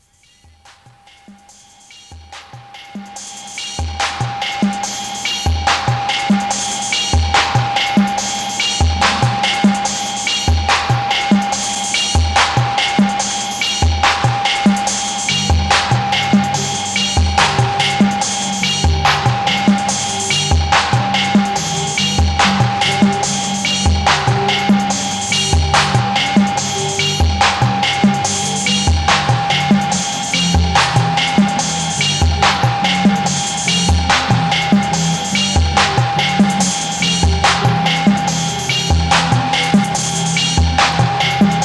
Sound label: rhythm section